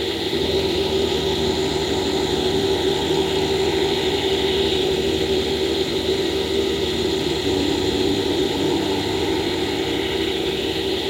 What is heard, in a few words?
TV Day Clock; Game rip; MiSide